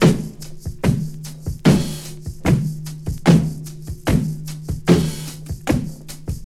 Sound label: 75 bpm drum loop e key, Free drum groove - kick tuned to the E note. Loudest frequency: 840Hz